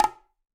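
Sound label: Bongo High Flam; Bongo B H Flam 3F; Latin Percussion; Drums; Drum Hits; Ableton; Factory Packs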